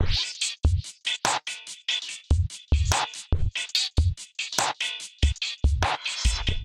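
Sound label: Bouncy (C minor) 144 bpm Ride 16th; Ableton; Factory Packs; Samplification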